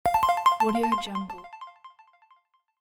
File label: points achieved; game